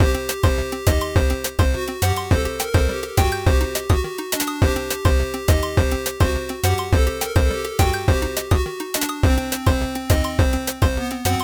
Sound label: lead; cube-tube; pyramids